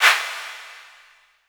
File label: CLAP -R, Drum & Bass; Ueberschall; Drum & Bass Resonance